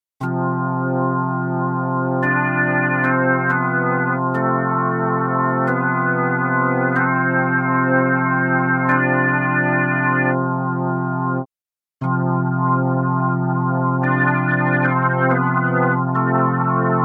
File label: DrawBar Dry Wet